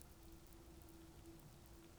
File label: pulses, BALAENOPTERA; MONACO 2022-07